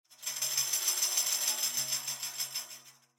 Tambourine Roll

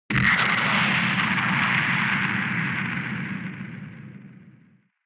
Retro Anime Jet High Long

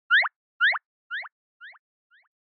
Anime Motion, High Delay

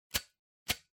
Lighter Flick

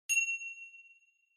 Finger Cymbals Mute

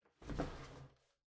Cardboard Box Far Pick Up; ダンボール; 遠い 持つ; 梱包材

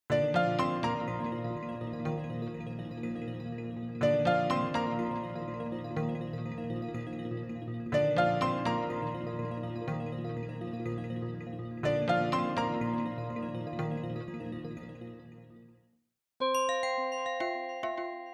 Smartphone Ringtone

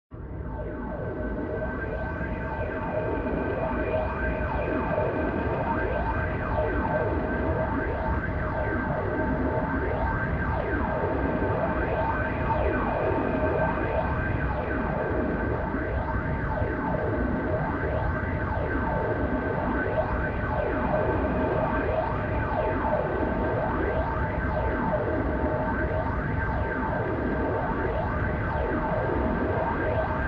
Retro Anime Jet Low Close

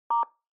Telephone DTMF Star

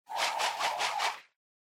Motion Swish Low Mid; モーション スイング09-10(低 中)

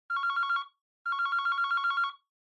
Smartphone Ringtone Speaker

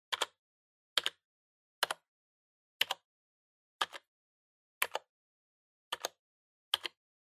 PC Keyboard Single Gentle

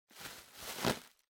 Packaging Air Cushion (Rustle); 梱包用エアークッション; ガサガサ; 梱包材

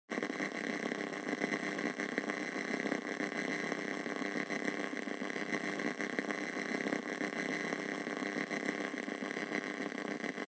Radio Signal Noise FM

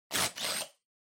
Blowout Close

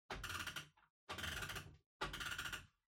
Pendulum Clock Hardware Far Wind Up

